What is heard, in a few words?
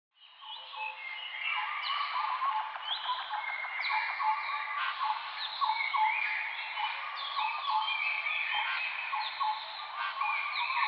Birds chirping in forest